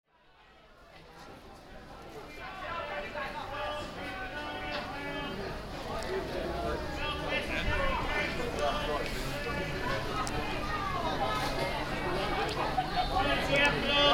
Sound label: Leicester Market binaural recording